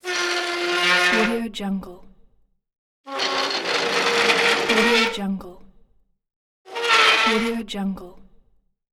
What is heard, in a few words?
moving chair